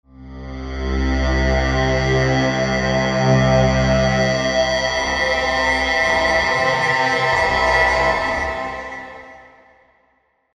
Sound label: Textures Tonal; Gravity 2